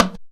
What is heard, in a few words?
JUNKU HITS; Best Service; World Colours